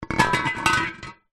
household flask thermo metal set down in side on wood wobble; zapsplat